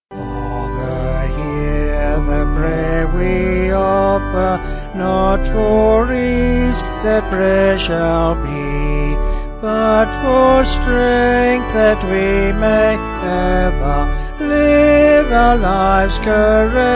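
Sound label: Vocals Pipe, Pipe Organ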